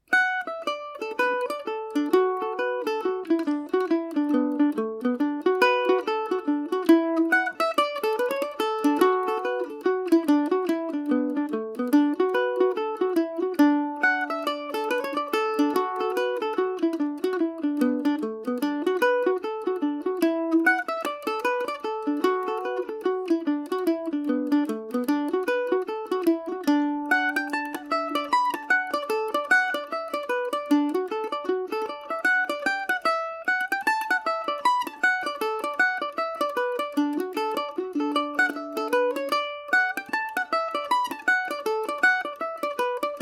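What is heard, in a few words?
kittys wedding D major, irish mandolin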